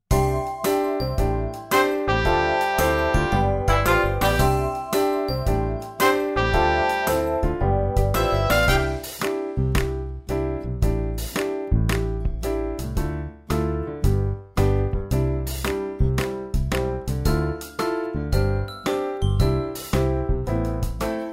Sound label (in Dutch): wijde wereld instrumentaal